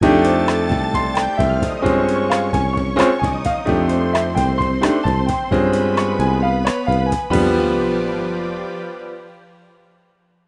Bari Sax

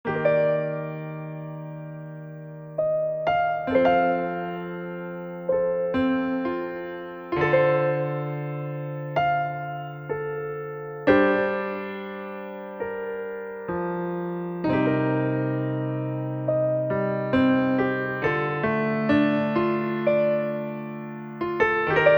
requiem (piano version); Disconation

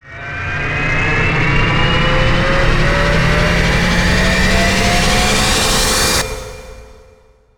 chainsaw battle tension; one finger death punch 2

